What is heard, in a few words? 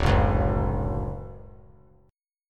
D Major 7th, chord; strummed; JGuitar